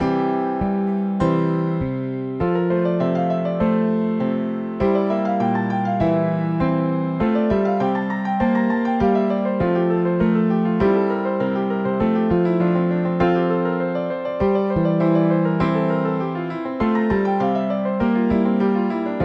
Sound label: piano exercise; First, a little pick-me-up piano exercise.